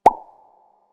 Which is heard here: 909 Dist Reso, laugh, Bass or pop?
pop